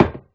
Step Metal Refined

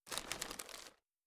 Impact Chipbag

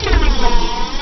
SFX - BOMBARD; Jeff Wayne's The War of the Worlds

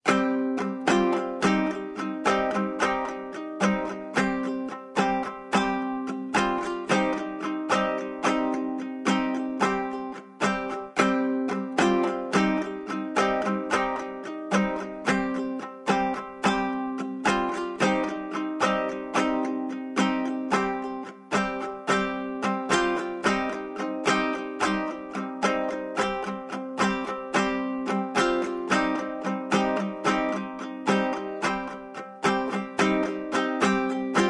bard kitara; assassin s creed valhalla

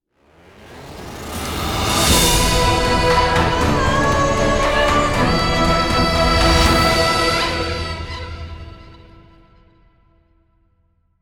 Victory; stormgate original soundtrack; Celestials (Victory)

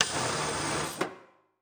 Car Start; MiSide

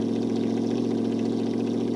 Truck Engine; 101-in-1 Explosive Megamix; WiiWare

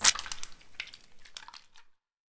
Jellyfish Squish

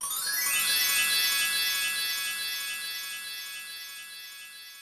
Magic; mahouteq

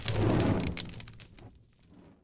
D M G Fire

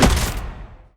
Wood Break

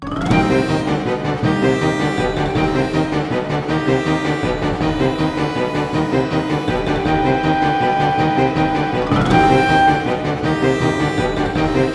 Battle

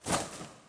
Vase Angelwings Flap

